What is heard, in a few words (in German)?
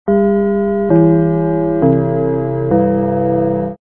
gong